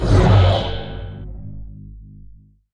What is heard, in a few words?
ion shell cast; weapons; dota; dark seer